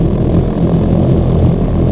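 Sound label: HOVERCFT, hovercraft; TRANSPOR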